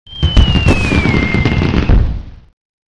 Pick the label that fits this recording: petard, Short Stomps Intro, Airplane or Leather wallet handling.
petard